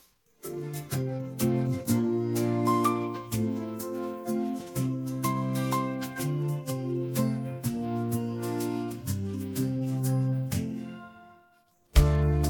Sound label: instrumental; минус детской песни; минусовка